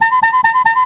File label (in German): FLUG; CD Action 47a 2000